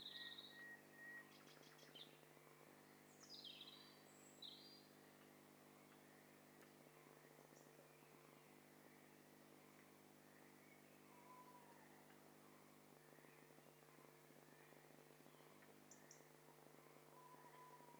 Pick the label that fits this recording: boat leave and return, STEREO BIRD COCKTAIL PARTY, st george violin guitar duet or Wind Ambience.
STEREO BIRD COCKTAIL PARTY